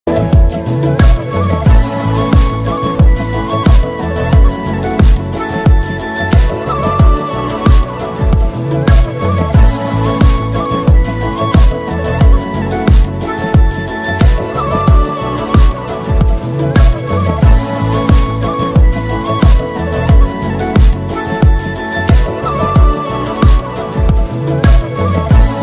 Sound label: instrumental ringtone; Munjya; Sharvari; Abhay Verma